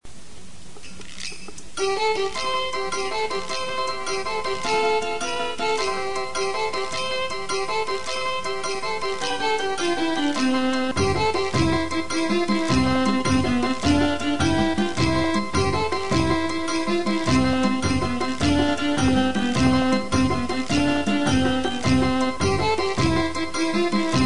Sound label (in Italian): pezzo musicale; SALTERELLO